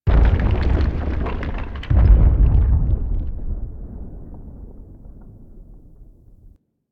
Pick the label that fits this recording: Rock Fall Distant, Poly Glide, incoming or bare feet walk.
Rock Fall Distant